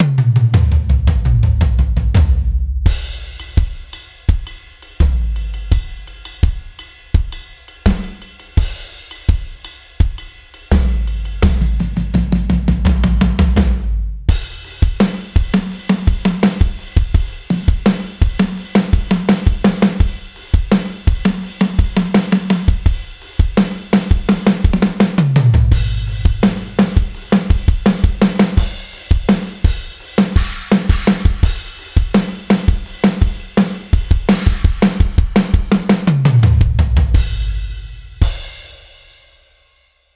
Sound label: gospel drum only; gospel drum kit ii; polarity audio